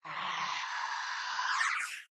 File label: die, rotter, monsters